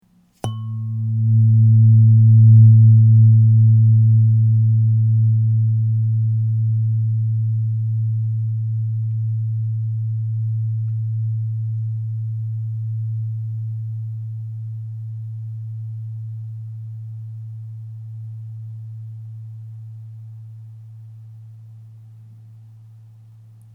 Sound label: 111Hz gold tone, tuning fork; sacrednotes